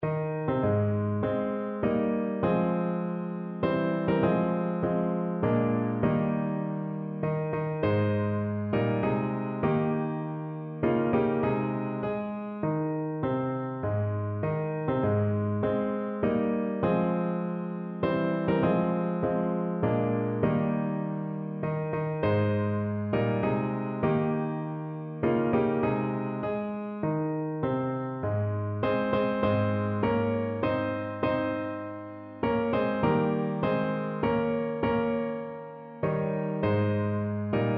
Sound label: us star spangled, piano